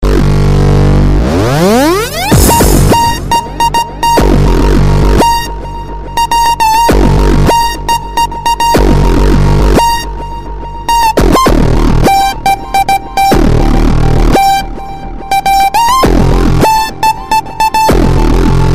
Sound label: super club ringtone